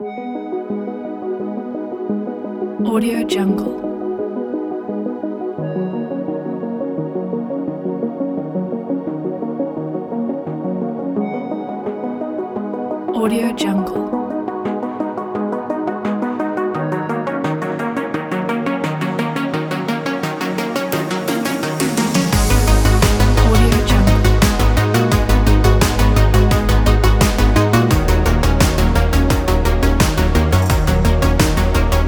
synthwave

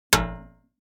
impact metal plate hit damped crunchy

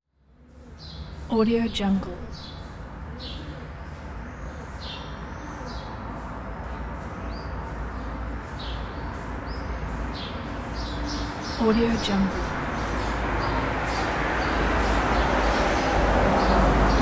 city ambience with traffic and street cleaner

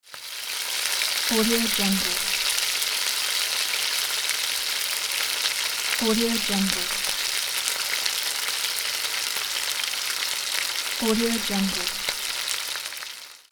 frying pan; greenwaysound

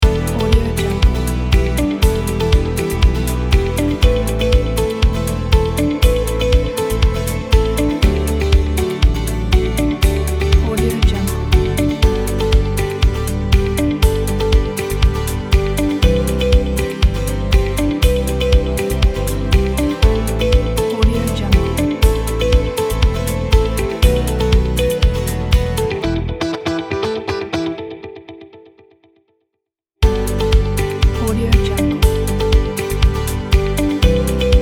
ambient corporate logo pack

